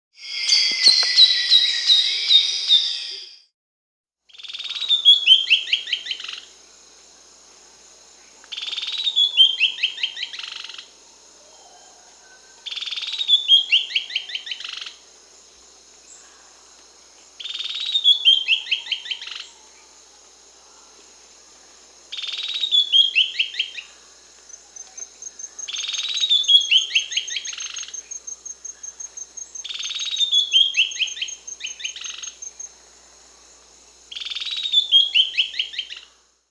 Black-tailed Leaftosser (Sclerurus caudacutus), Bolivia, Peru; S. America